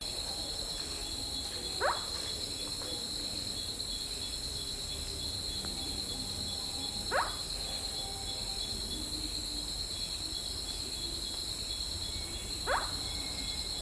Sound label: Indian Scops Owl Otus bakkamoena; Ladakh